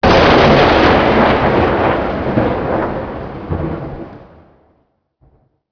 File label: lightning; KATANA; Daikatana